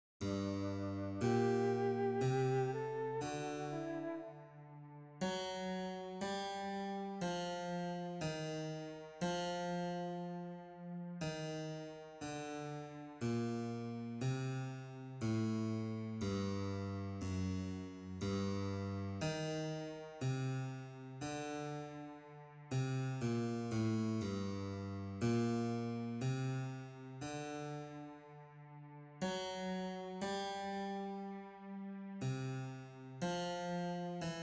Karaoke g Adagio; solfege eerstejaars zingen ritme

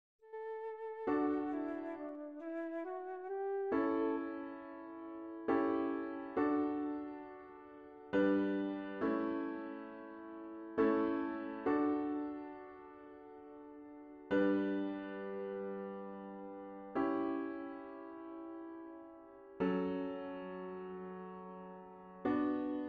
Karaoke d Adagio; solfege eerstejaars zingen ritme

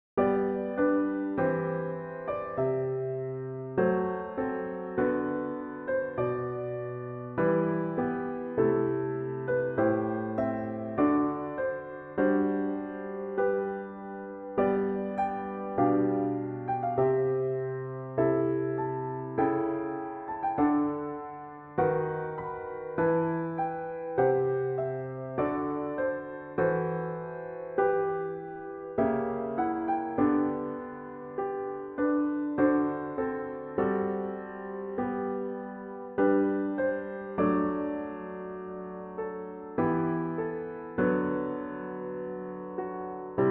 tentamen harmonisch, solfege

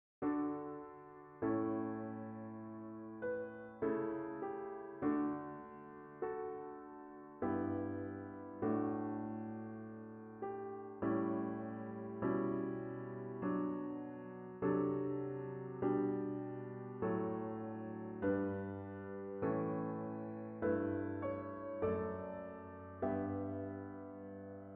tentamen harmonisch, solfege

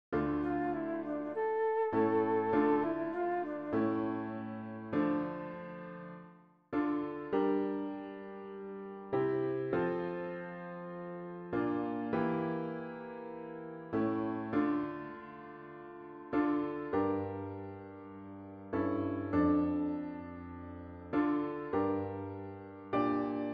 Karaoke snel; solfege eerstejaars zingen ritme

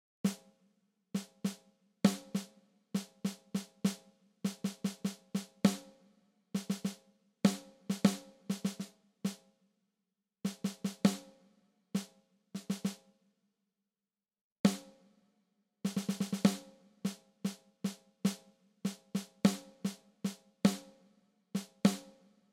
tentamen ritmisch, solfege

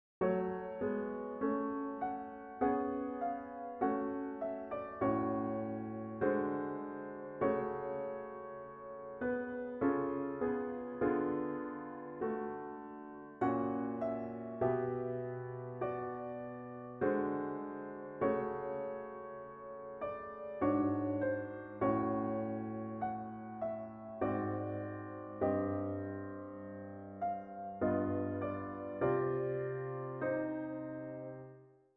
gealtereerd leidtoonverplaatsing enharmoniek

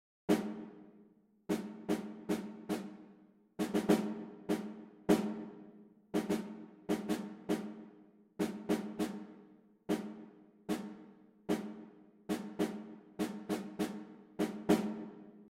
tentamen ritmisch, solfege

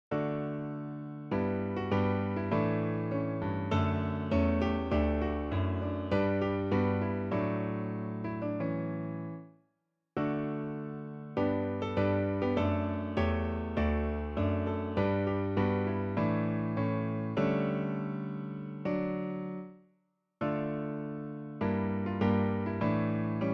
harmonisch

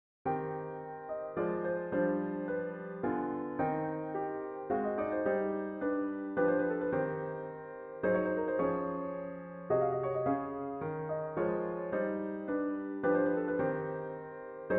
gealtereerd verhoogd IV wordt V7 modulatie napels

